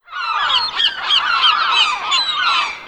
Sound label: flock of seagulls; daniel simion